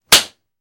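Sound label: Face Slaps Heavy Sharp; flesh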